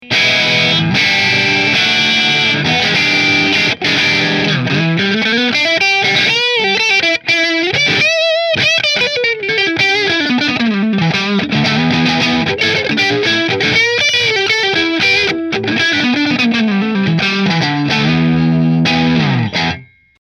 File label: Middle Marshall; Heatley